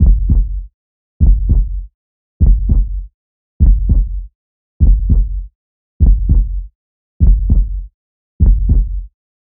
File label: loud heartbeat